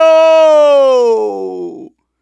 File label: Native Vocals, Native American